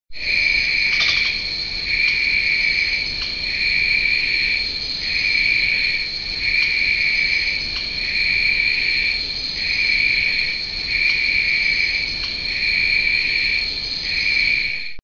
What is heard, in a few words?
Frogs n crickets; frogs toads; tadpoles amphibian